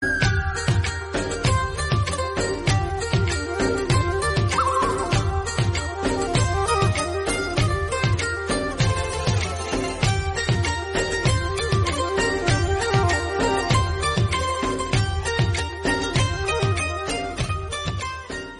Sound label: Instrumental